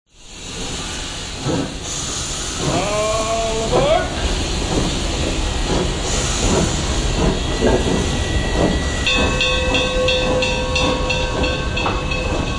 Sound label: Men's Choir